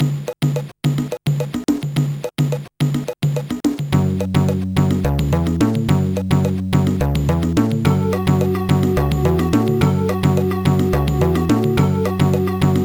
rhythm test; MusicLine

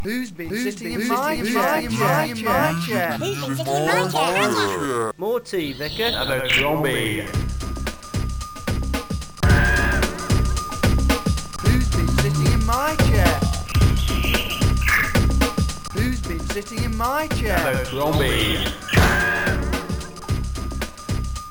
OctaMED

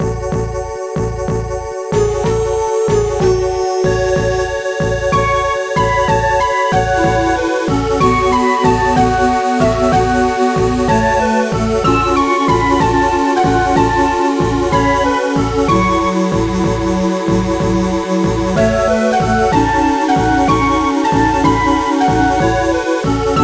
cardiac flute; Carbon

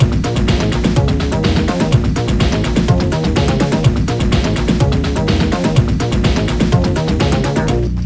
level end, Trackers; rotox; Rogue Male; Trackers Modland

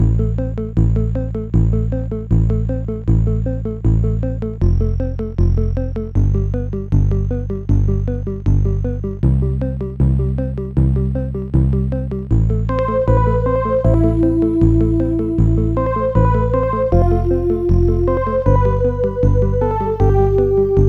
horror express; MusicLine

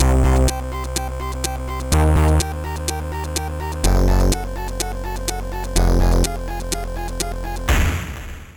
clock

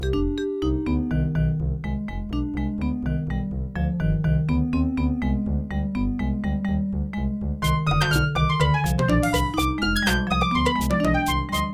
loco midi; Bars and Pipes

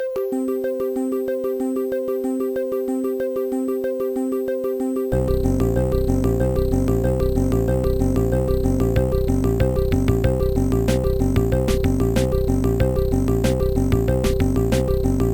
chiptune

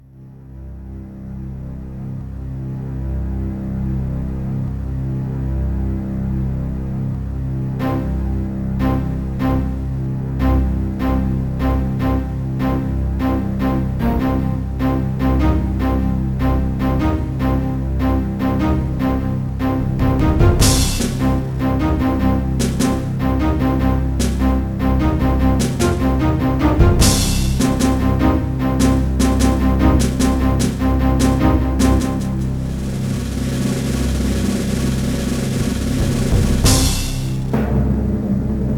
mod orchestral, Trackers; Modland

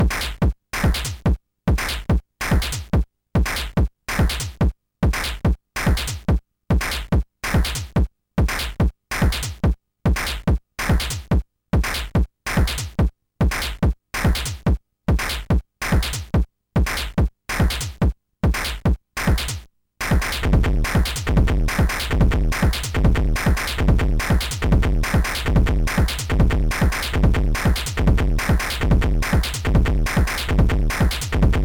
bassic tune, DigiBoosterPro; Acid Frog

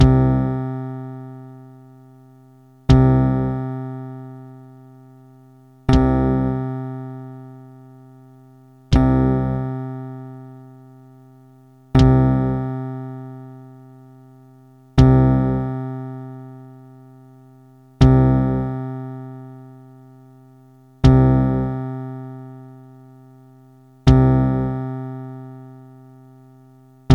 waltz style; SuperJAM!

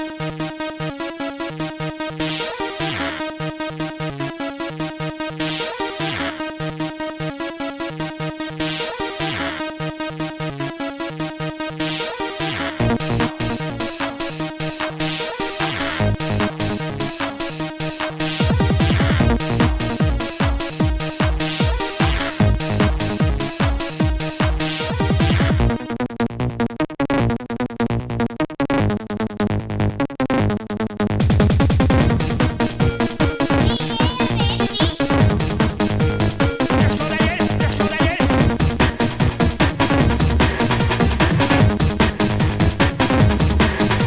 fantasy trance; sc-7; Trackers; Modland

